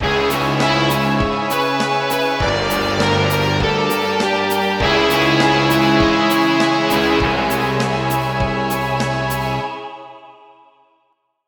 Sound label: Death Bad; sonic epoch